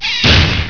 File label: hoof; Pro Android Games; Wolf3D